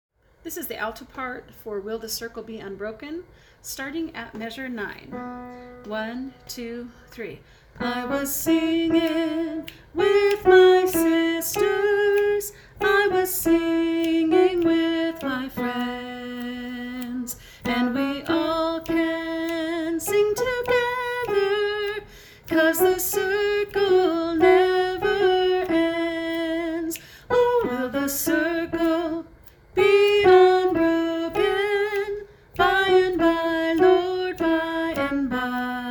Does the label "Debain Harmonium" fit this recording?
No